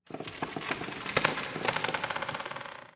Ambient; Forest

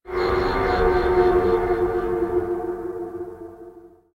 Chase; SCP 860

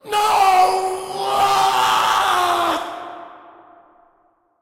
Attack; SCP 939

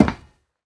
Step Metal; scpcb-godot

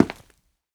Step

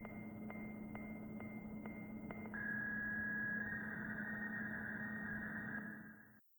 Ambient; Zone3

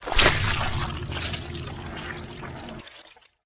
Tentacle Attack; scpcb-godot

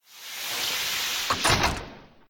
Door Open

